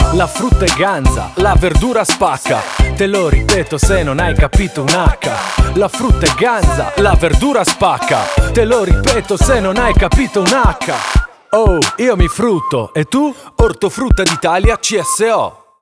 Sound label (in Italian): RAP; ORTO-FRUTTA